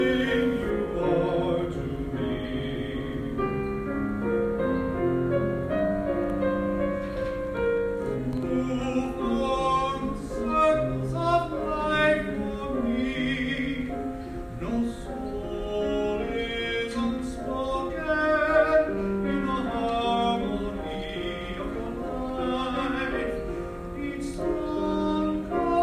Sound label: singing